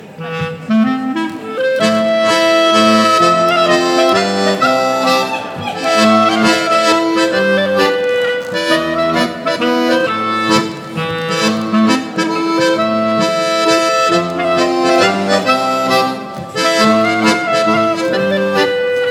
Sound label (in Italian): mazurka del medioevo